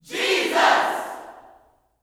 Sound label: YELL SHOUT, Vocal, Gospel; JESUS; Spectrasonics; Vocal Planet